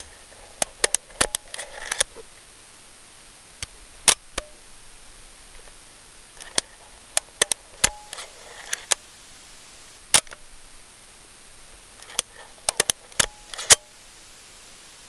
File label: camera film rewind lever and shutter slow; Sonniss GDC